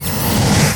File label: sfx sci fi; Masterbits - Switch FX